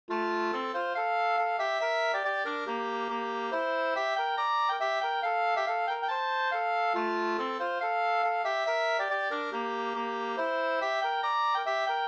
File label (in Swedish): schottis; Enviken; Vilhelm Hedlund